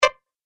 Tap Little Hit; Tapping Sound Effects; object sound effects

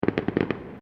Fireworks Multiple Crackles; Backgrounds; Crafted Signals

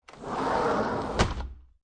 Door Close Sliding Glass

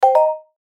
chime mallets; Chimes; Multimedia; Blastwave

